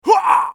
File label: scav wound; eft